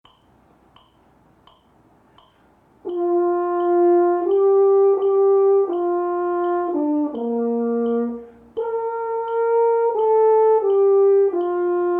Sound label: Horn Russian Anthem